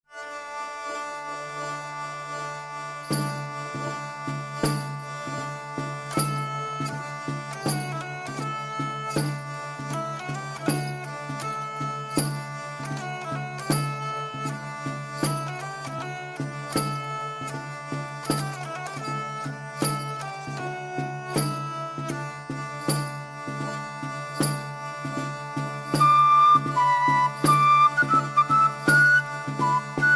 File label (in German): Drehleier